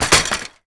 give bicycle; Golden Crayon Sounds

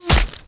impact; Original TK Sounds

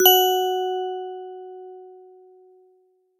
group invite; Golden Crayon Sounds

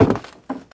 bridge step; Golden Crayon Sounds

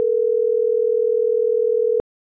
ringback tone ansi; iOS 26 Sounds

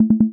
Activation Error Tone; iOS 27

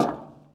bleacher step; Golden Crayon Sounds

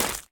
ice step; Golden Crayon Sounds

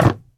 shelter land; Original TK Sounds

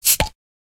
open beer; Original TK Sounds

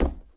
wood step; Golden Crayon Sounds

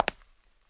marble step; Golden Crayon Sounds

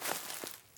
brush step; Golden Crayon Sounds

